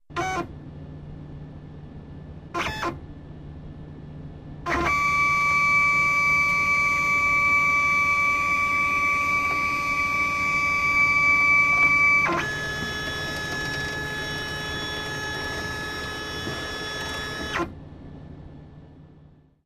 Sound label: Computer Scanner